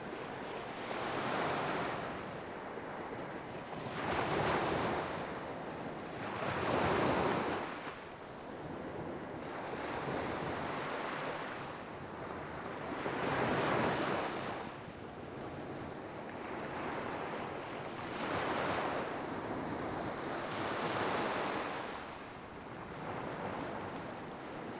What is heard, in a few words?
Coast Bed Lp, Ambient; Civ4